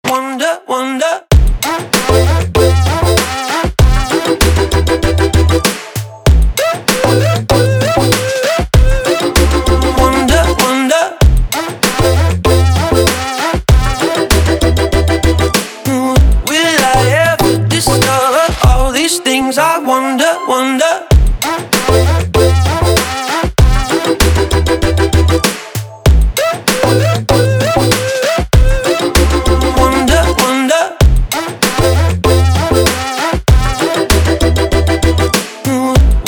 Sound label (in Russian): Отбивка Snareskin; 203 ОТБИВКИ